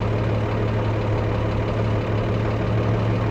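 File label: Chevy Truck 46 Idle Loop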